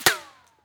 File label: bullet ric; weapons; rics; Counter Strike Model Pack; CS2